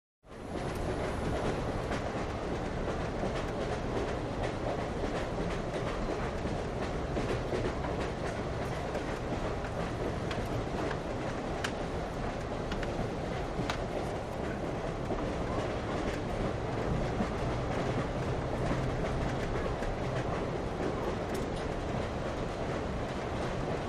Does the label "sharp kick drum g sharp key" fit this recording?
No